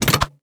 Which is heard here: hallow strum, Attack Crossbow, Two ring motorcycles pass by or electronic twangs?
Attack Crossbow